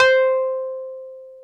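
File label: piano, Wurly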